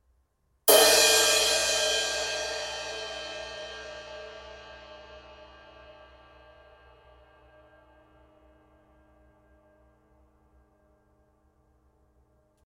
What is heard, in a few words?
zildjian